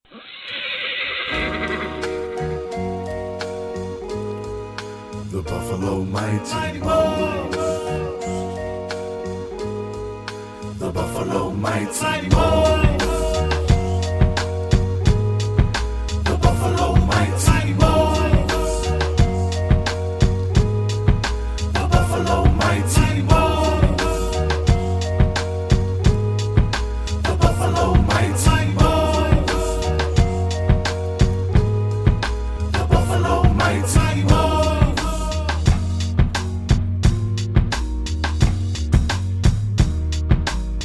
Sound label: DANCE SONG